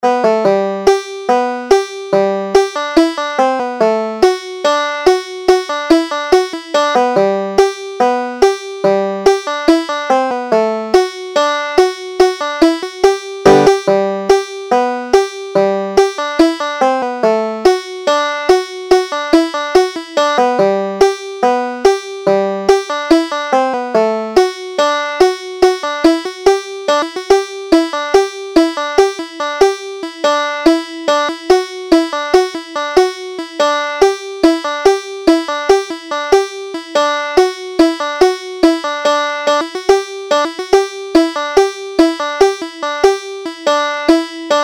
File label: pennsylvania banjo piece